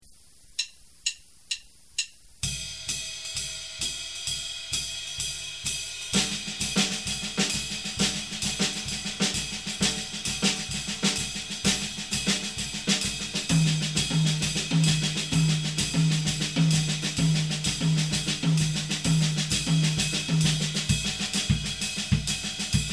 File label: 3 against 4 jazz